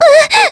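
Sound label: Vox Damage, Korean; Lewsia A; kingsraid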